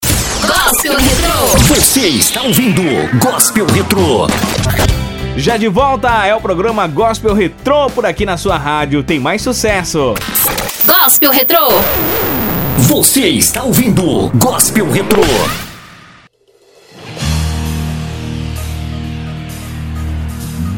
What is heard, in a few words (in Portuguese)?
Gospel Retro